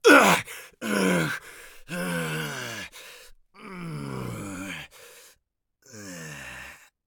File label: agony; Escape from Tarkov